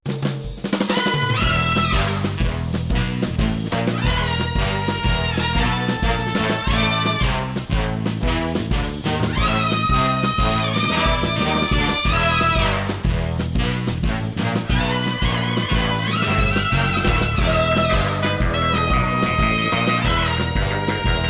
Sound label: Intruder Alert loop; thejuke